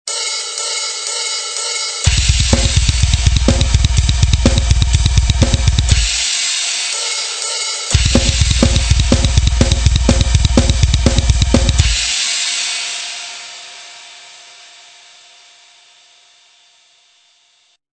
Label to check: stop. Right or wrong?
Right